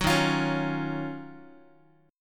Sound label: D sharp Minor 9th D sharp, down, Guitar; D#m9 strummed; 9,11,8,11,x,x; 4A,4E,5A,5D,5G#,6C#